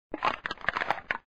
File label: Can Being Crushed